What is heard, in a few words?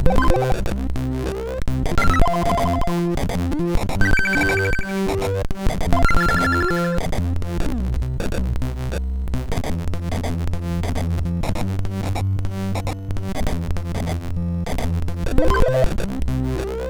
A Happy Gametune, MOS6581R3